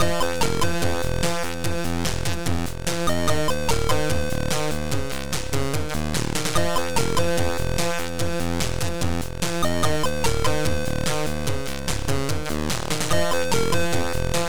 BEAT

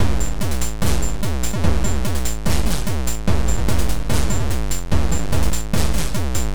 BEAT

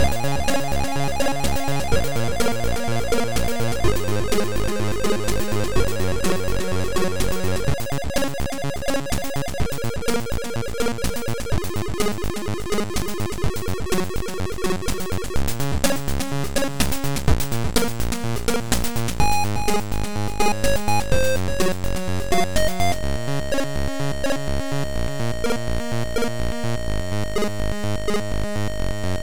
Pop

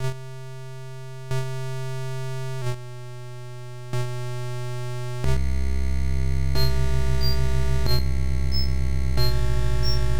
SCARY MUZIK

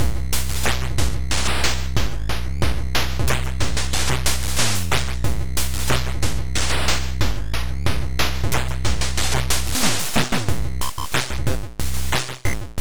NiceDrum

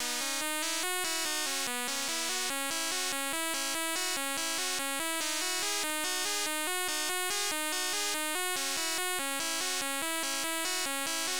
Title Beeper; Ice Cool Coders 1999

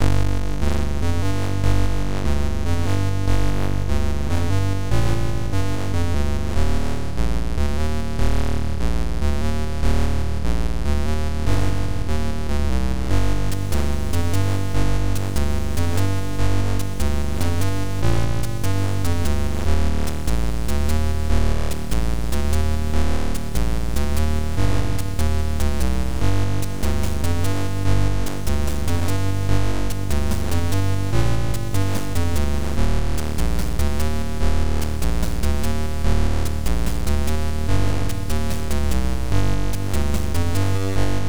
Game Over; COD

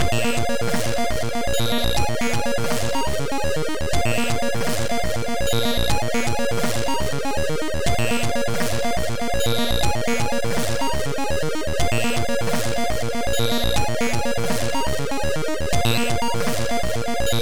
ambience

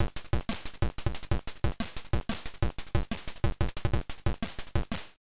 drum

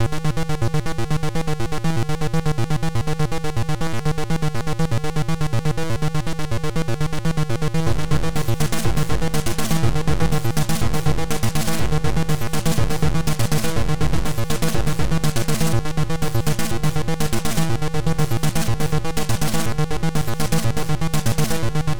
TRANCE

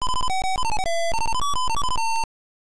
Title Beeper; Skool Daze

